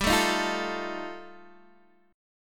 G 7th Suspended 2nd Sharp 5th G chord, Guitar Open D